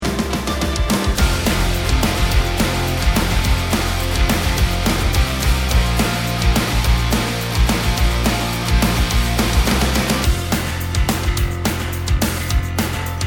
start action, music

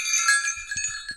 windchime

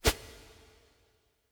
swish, halloween; player

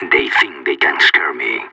scared emote; Counter Strike; CS:GO; separatist

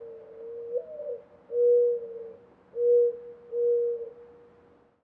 dove call

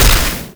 pushback, zombie revived; scripted intro; Half Life Alyx

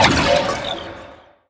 impact, physics, vault

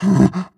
breath short, zombie

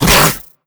grunt hard, headcrab; creatures; vox

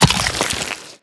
bite pull, barnacle creatures; Half Life Alyx

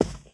carpet, footsteps

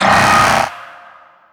target acquired, strider; creatures

